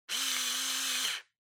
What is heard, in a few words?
Spice Mill Pepper